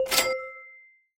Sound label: payment